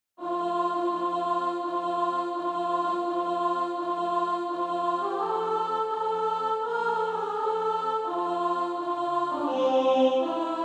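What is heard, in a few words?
alto, SATB Practise Music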